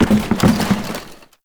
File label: Janitor Cart Impact; Warframe; Ambience; NineteenNinetyNine